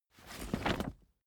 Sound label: camping chair rig up hard